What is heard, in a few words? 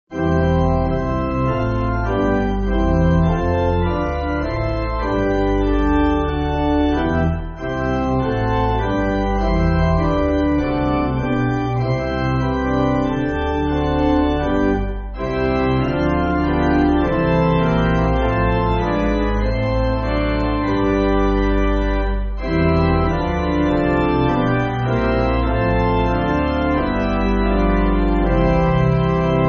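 Pipe Organ, Backing Files for Churches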